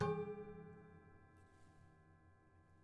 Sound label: Prepared Piano